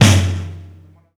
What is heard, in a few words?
big acoustic snare f sharp key